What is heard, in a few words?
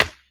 base hit; casualtiesunknown